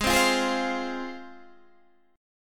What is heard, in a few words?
C Suspended 4th Sharp 5th G sharp